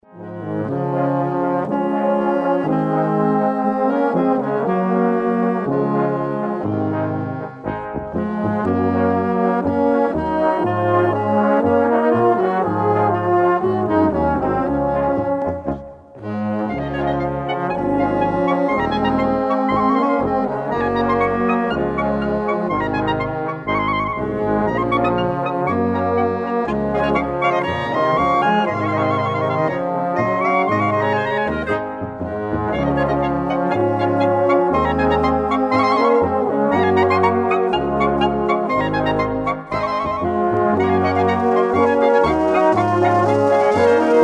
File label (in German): Blaskapelle